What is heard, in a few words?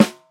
clear snare drum sound a key, tuned snare